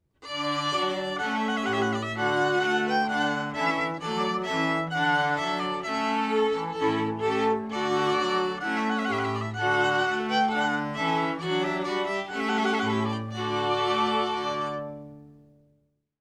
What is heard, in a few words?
Trumpet Voluntary; Clark